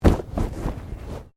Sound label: Body movement Fall Medium